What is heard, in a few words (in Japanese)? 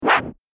振り回す